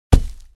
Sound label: Dirt surface impact hit